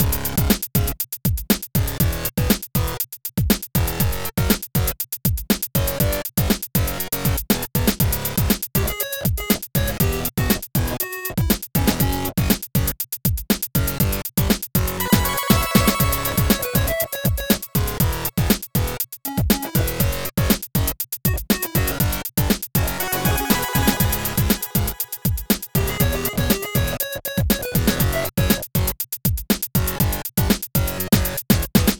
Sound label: Menu Loop